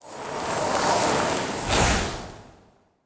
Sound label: Ice Spell, Magic Sounds; Fantasy Sounds Bundle